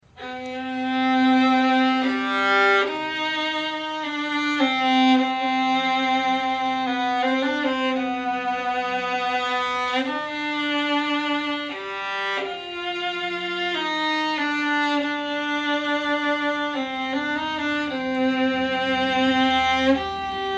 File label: viola